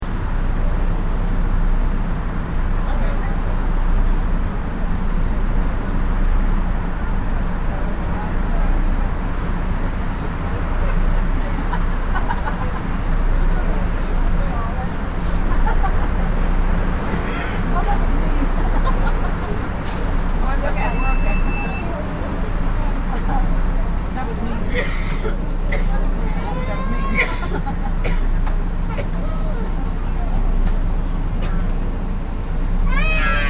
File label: Electric Train Interior Medium Constant Walla; Various people sounds, a lady laughs, a baby cries, doors; Train Sound Effects; Lynne Music